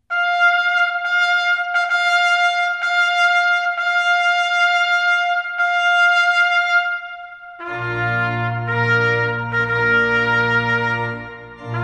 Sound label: Bridal Chorus trpt